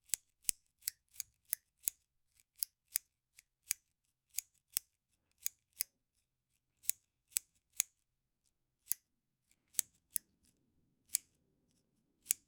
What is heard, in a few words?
scissors cutting